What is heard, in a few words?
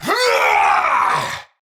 Attack Effort, Battle; Warframe; Tau; Dax; Knight